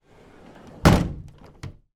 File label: household door patio slide close; zapsplat